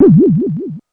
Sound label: Sun Effect; SE Ing Other Rule#27 (SE Sun 02 Effect)